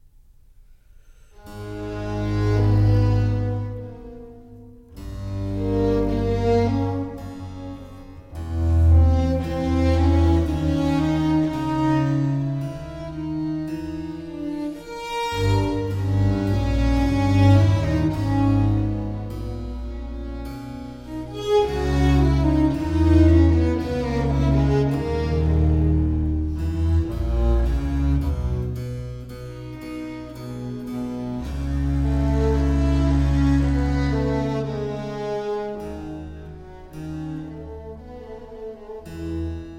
concerto for cello strings and basso continuo in a major largo; II. Largo con sordino